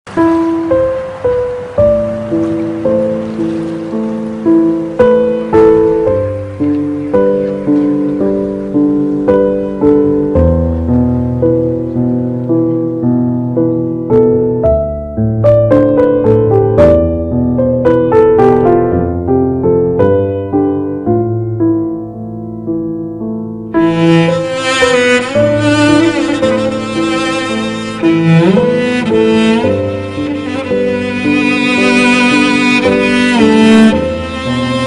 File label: Duygusal